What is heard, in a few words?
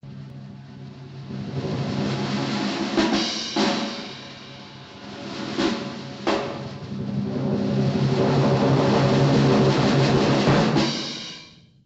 fanfare, drum loops, rolls